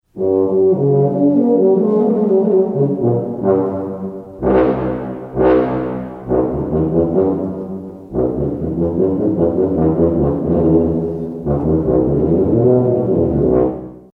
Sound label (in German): tuba